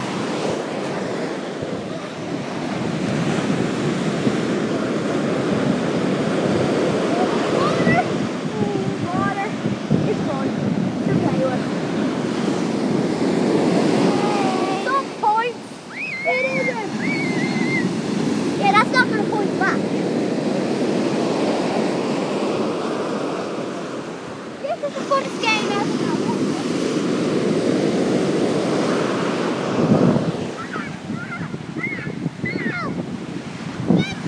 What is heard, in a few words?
dunquin waves